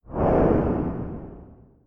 Woosh

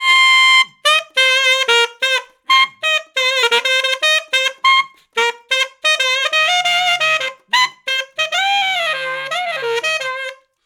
Alto Sax 90bpm Cm Solo

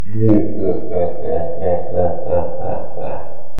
Evil Laughing

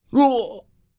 Dying

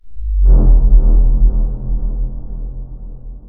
Dark thump wave

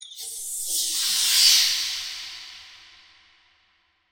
Fizzling Flashback Verb

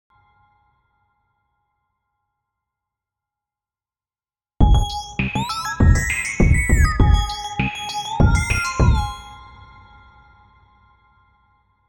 Jingle

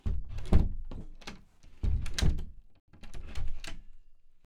Door Shutting And Opening

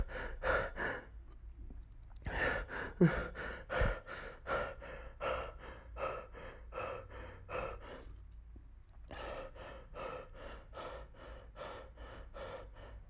Heavy Breathing

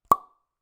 Cork

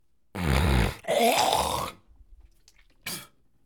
Loogie

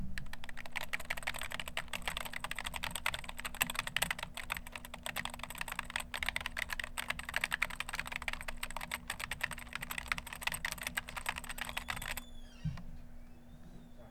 Computer Typing

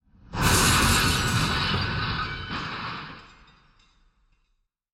Metal Crash

